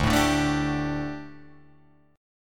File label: D Suspended 4th D, Guitar, Drop D